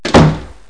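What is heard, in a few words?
door close; My Science Fair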